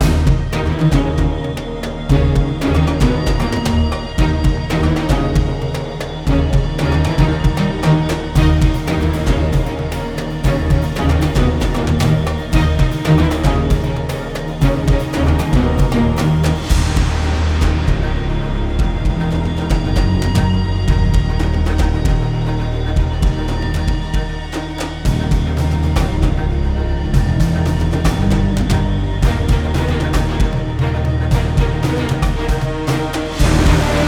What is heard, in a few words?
Land Combat Desert Night